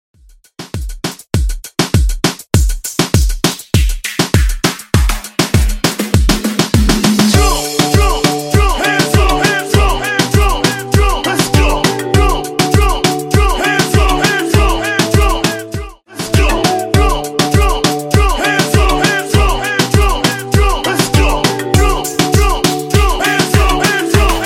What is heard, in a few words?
Moombahton hype 100 98